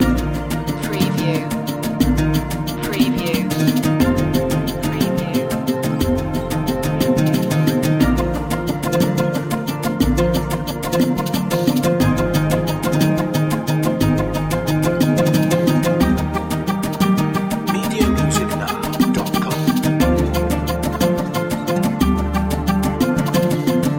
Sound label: world dance loop